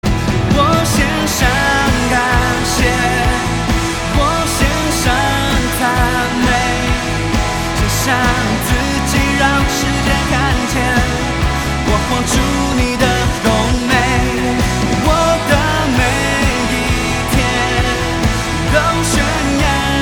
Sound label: MANDARIN